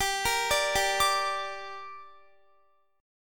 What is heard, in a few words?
G Minor G down Guitar, chord; Gm arpeggiated